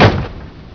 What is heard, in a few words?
CRASH